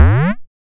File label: SYN TON